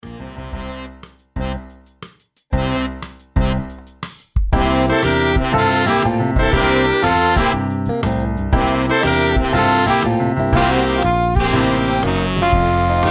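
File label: Sax Ensemble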